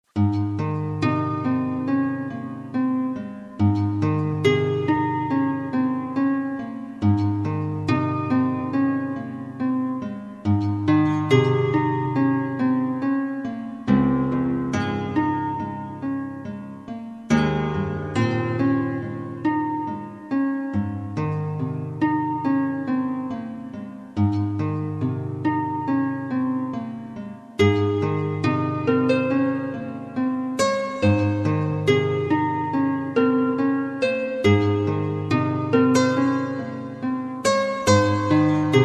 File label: hawaiian guitar; valentines day